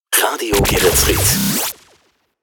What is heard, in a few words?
Jingles Shotgun; Radio Geretsried